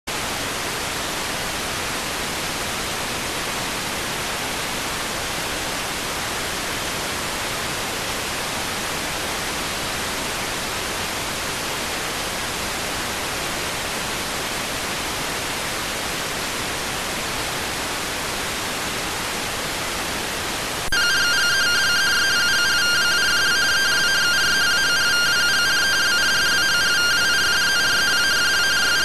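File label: radioteletype receive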